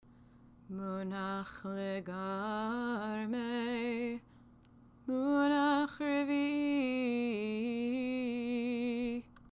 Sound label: Munach Legarmeh Munach Revii